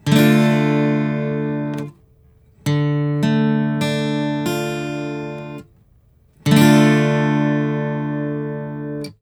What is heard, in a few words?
Major or Minor